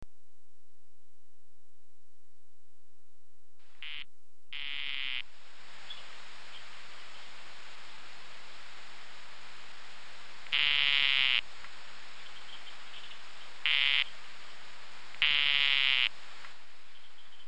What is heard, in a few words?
D tinctorius, chants